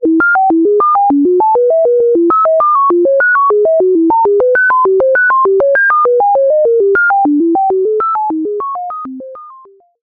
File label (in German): r2d2 band pass selbstoszillation; universal audio moog multimode filter xl